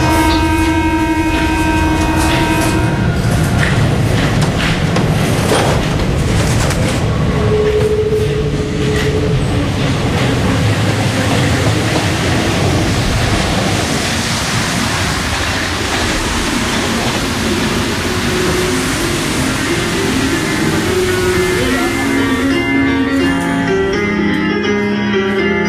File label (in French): soprano lyrique; Stations melomanes